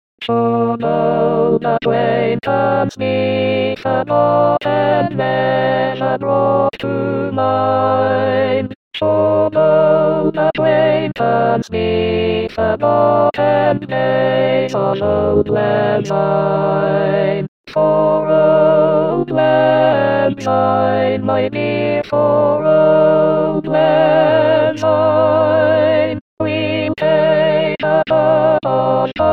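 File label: alto; Auld Lang Syne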